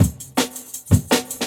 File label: Jungle Breaks; Original Jungle Breaks